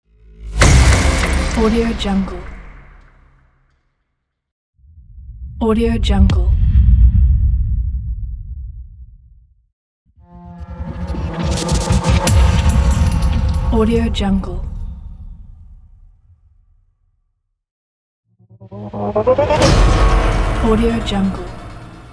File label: power strike pack